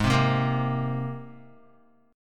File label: G sharp Major Add 9th G sharp, Guitar; G#add9 strummed; JGuitar